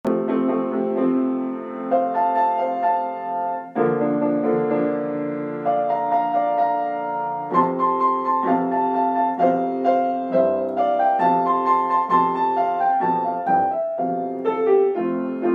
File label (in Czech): dudy v edinburgu klavir